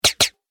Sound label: Silencer Gun 2 Hits, gun shot; Impact Sound Effect